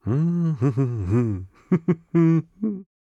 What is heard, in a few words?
voice humming